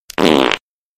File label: fart